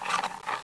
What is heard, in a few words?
ammo shells